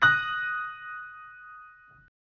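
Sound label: D sharp m highest, chords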